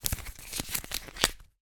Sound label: money notes flick through hand; foley